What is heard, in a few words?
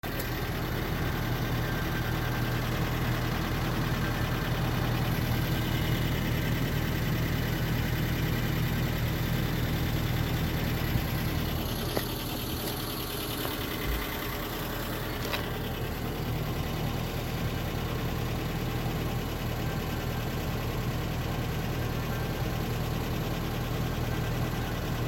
water liquid coming exhaust tail pipe b2000; mazda bt-50 pickup trucks